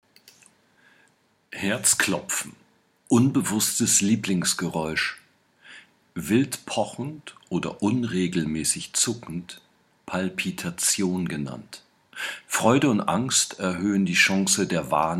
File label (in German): herzklopfen